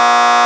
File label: caddy idle; GTAIV